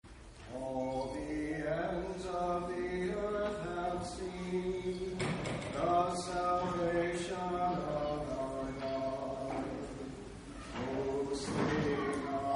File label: Communion Antiphon